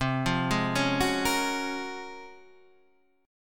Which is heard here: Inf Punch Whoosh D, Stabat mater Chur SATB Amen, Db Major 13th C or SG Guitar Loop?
Db Major 13th C